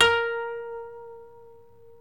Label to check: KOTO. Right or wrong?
Right